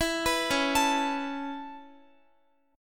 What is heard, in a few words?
C sharp Minor 7th Sharp 5th C sharp Guitar; C#m7#5 arpeggiated